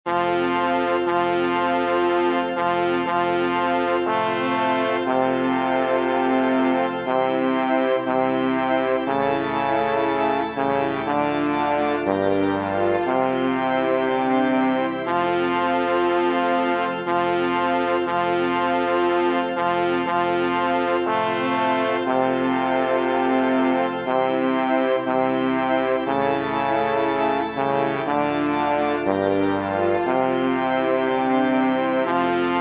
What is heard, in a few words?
organ; Schubert